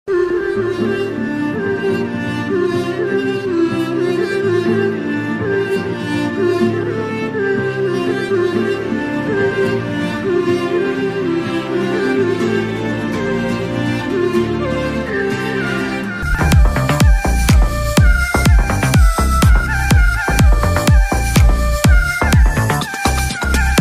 Telugu Movie BGM Ringtone; U Turn

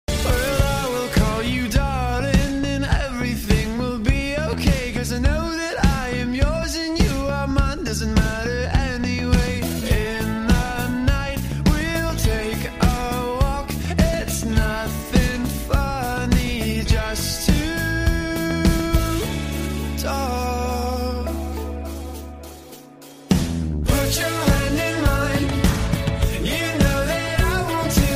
English Ringtone

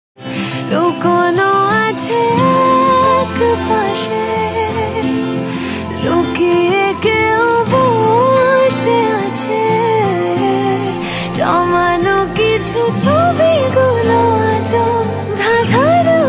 Bengali Song Female Version Ringtone

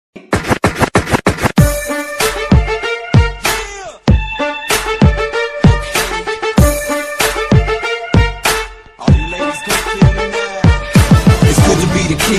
Attitude Ringtone For Boys

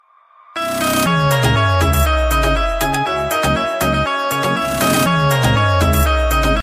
Romantic Ringtone Instrumental Ringtone; ringtones

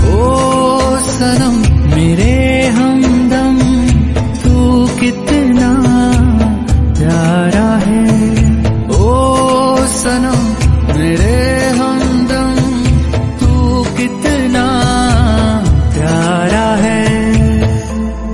Hindi Love Song Ringtone

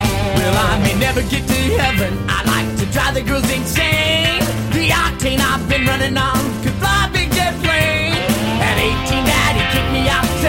Cowboy Attitude Ringtone; ringtones

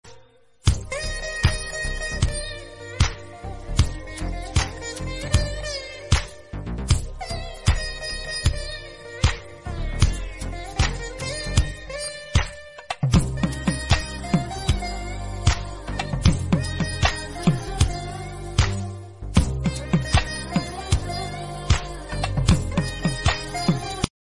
Jingles Music; Ringtone

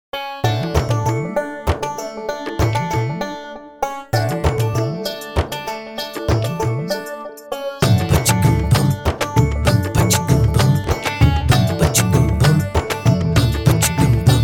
Music Ringtone

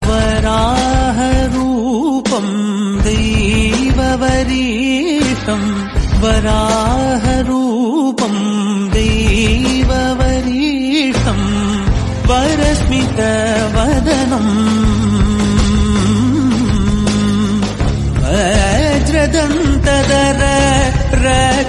Bhakti Ringtone

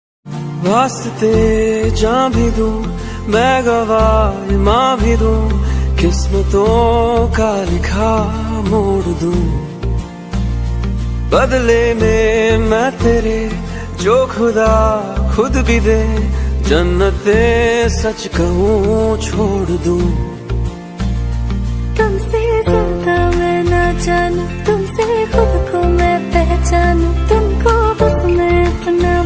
Male Version Ringtone

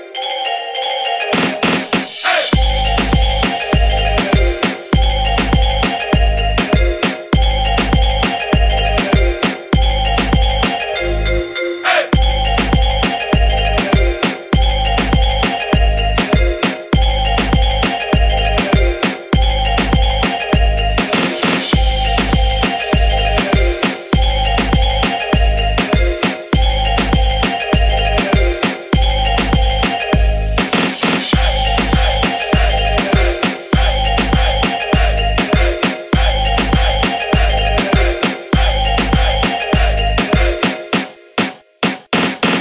Riddim Ringtone

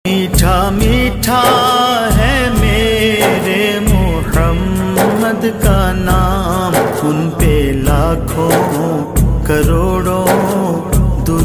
Naat Ringtone

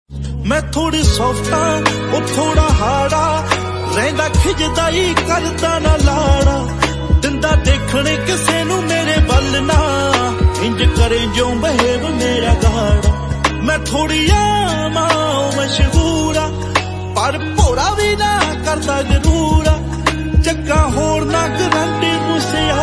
Punjabi Ringtone

